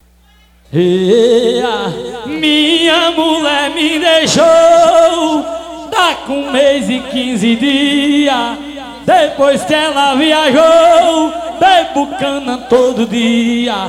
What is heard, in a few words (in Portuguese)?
vaqueiro ao vivo; a nova pegada das vaquejadas; petrolina